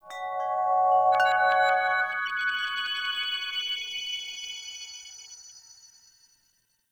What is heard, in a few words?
Chimes; Warframe; The New War; Narmer Outpost